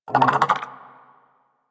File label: Armadillo Vocal Idle; Characters; Warframe; Hunting Critters